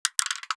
drop, small wood longthin pencil, highpass

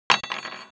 drop down shuffled, small metal longthin spoon

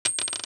dollar coin, clattering

